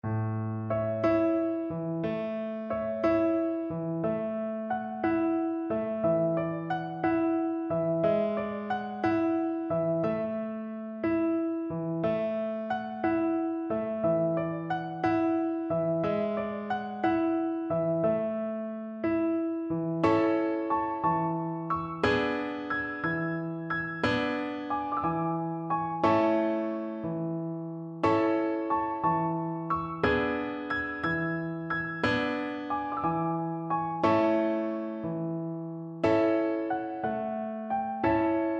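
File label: viola karaoke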